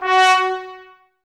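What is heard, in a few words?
brass trumpets F#; Miroslav Vitous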